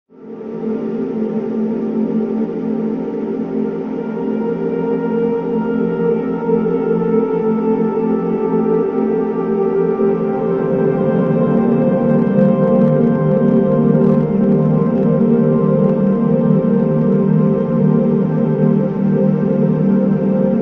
instrumental ringtones

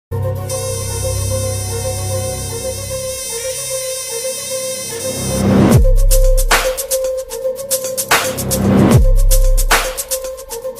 bgm ringtone south ringtone; rowdy rajkumar 2; gautam nanda

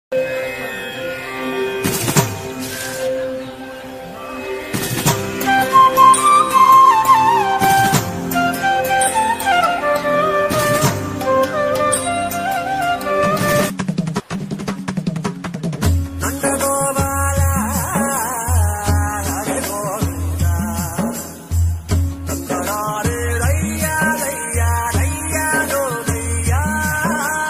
anniyan ambi bgm ringtone; evergreen

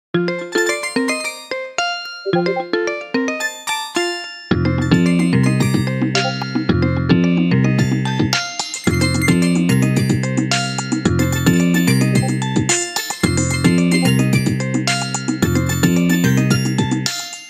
nokia ringtone

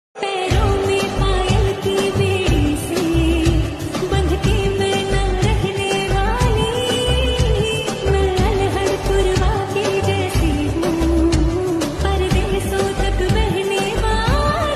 female version

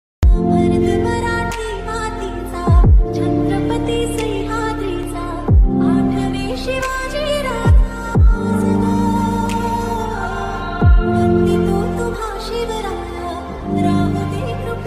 ringtone marathi song; shivaji maharaj

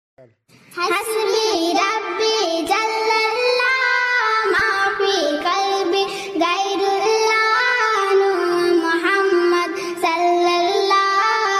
hasbi rabbi jallallah ringtone naat cute cat

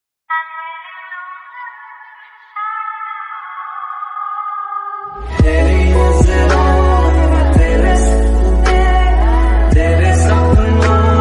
sad moodoff remix ringtone

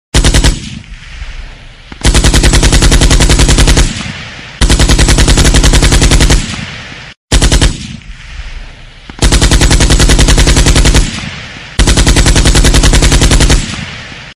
gun fire ringtone

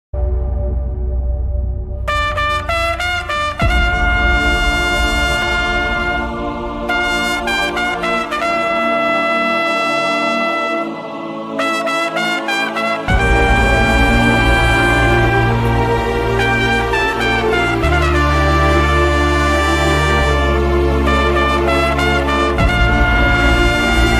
namma veetu pillai friendship bgm

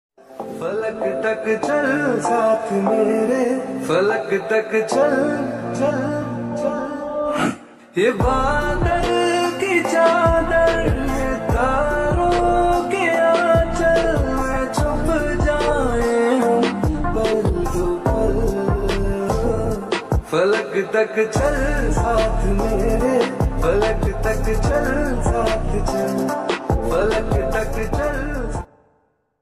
lofi ringtone

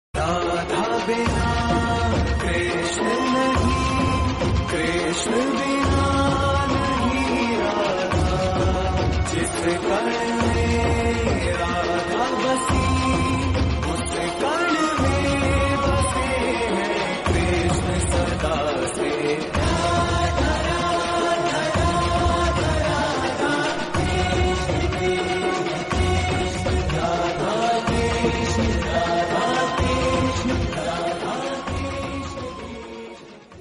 romantic love ringtone

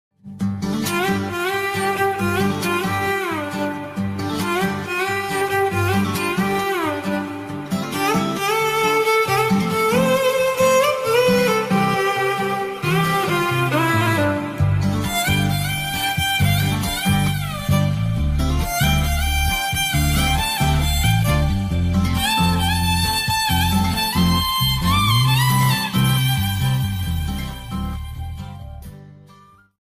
music; kumari 21f; telugu

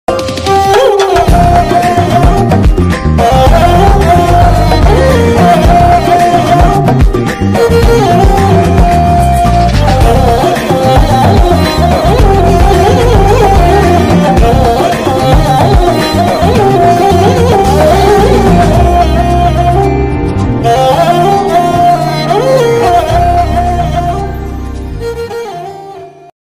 violin ringtone; agnyaathavaasi

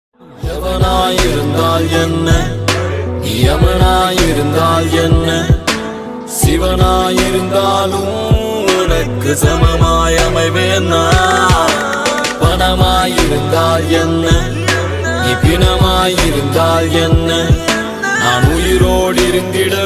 ringtone song bgm

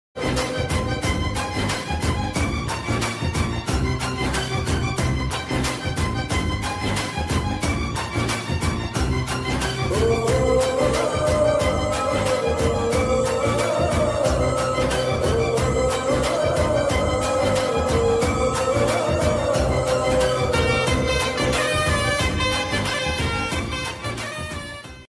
bgm music; sai dharam tej

